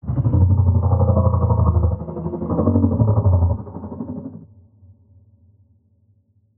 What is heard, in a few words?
HAMMERHEAD idle